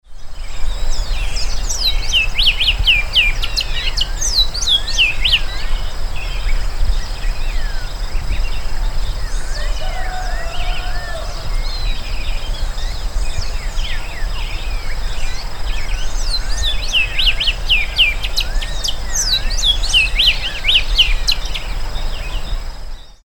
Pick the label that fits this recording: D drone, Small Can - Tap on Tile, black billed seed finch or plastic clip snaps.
black billed seed finch